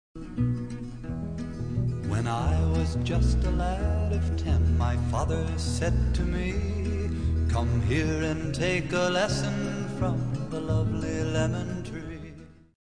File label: Music In Style